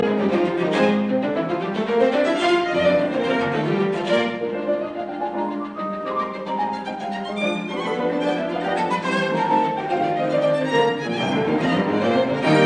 Piano Quintet in E flat major Scherzo Molto vivace; Trio I; L'istesso tempo